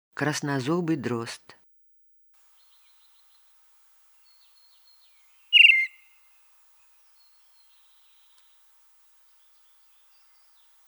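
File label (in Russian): krasnozobyy drozd golos, red-throated thrush voice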